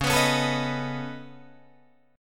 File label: C sharp 7th Suspended 2nd Sharp 5th C sharp down Guitar Drop D